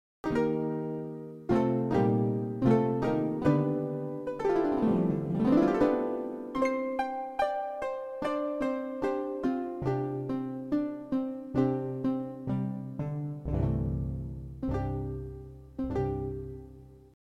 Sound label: Magic harp; king s quest 5 soundtrack mt 32